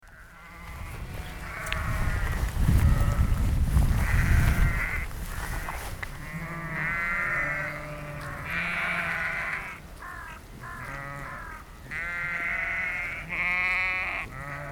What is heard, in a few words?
Roncesvalles Sheep Bleating